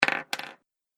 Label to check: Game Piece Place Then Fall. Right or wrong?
Right